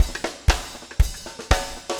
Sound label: 4 on floor 120, loops, 120bpm